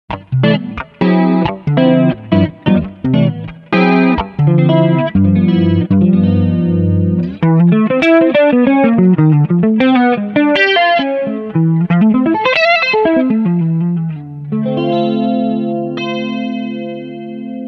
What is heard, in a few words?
clean; micro cube red jc